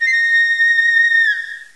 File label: pipe